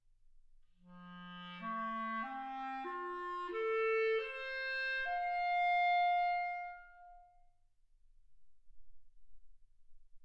clarinet

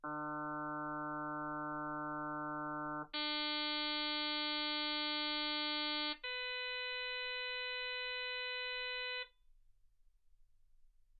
harm sinus 48kHz

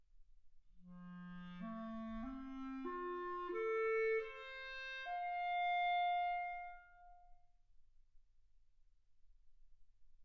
clarinet

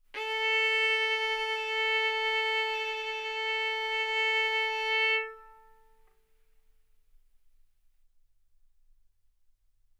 Violin arco mf sul A A4 B4 stereo

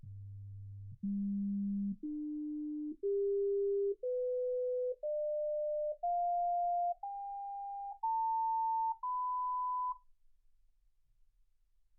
sinus tones 48kHz

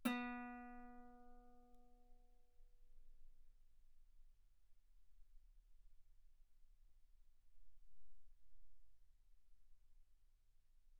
Guitar ff B3

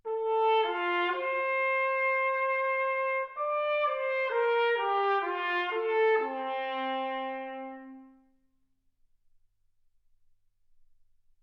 trumpet

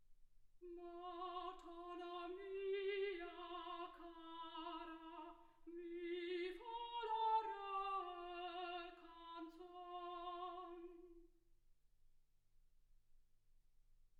soprano

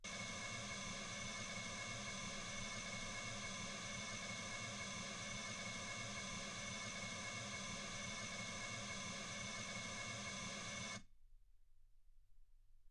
mls16 48kHz

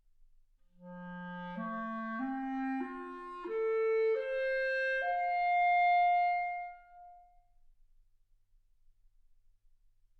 clarinet

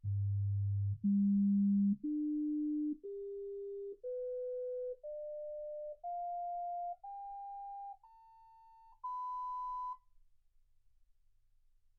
sinus tones 48kHz

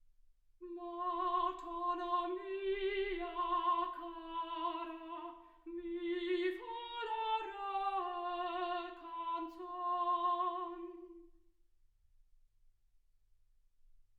soprano

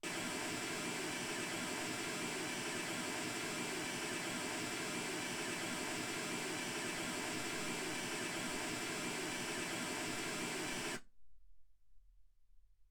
mls16 48kHz

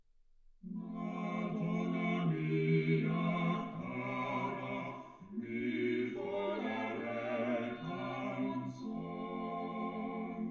quartet

